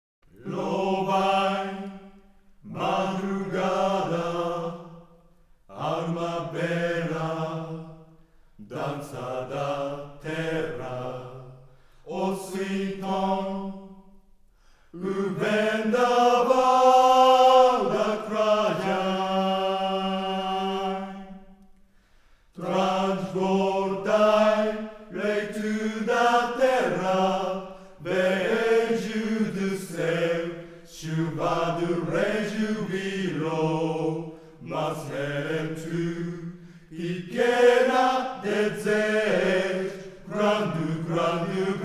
Traditional Song ~ The Villager's Chorus; Grandia 2

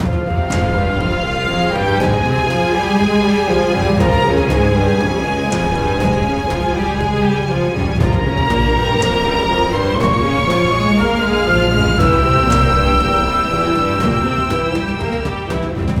String; Assassin's Creed Unity Dead Kings

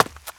run concrete

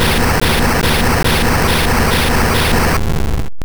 Earthquake; Pokemon; Gen 2; attack moves; GSC

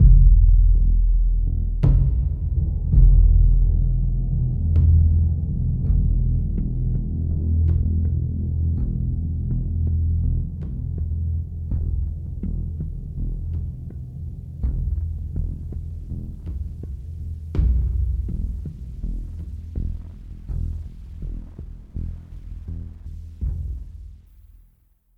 Linear Tense; the walking dead michonne

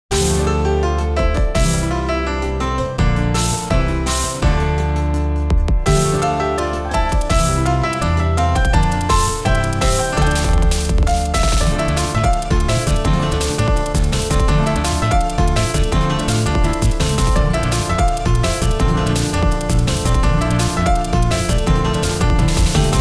Apparitions Passing Rain Shower; Touhou 18 Kouryuudou Unconnected Marketeers

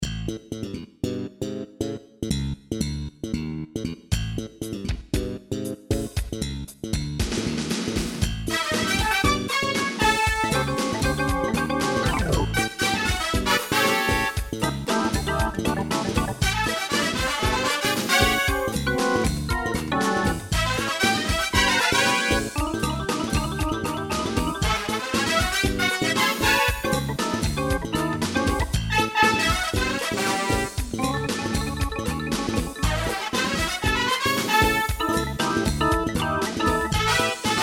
Funk; Dwango sounds

